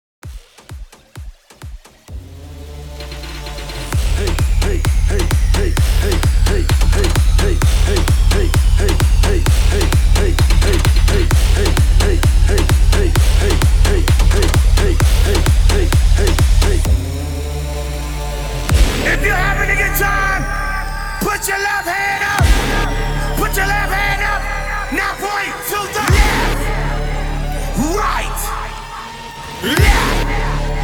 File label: BOOTLEG SMASHER 130 BPM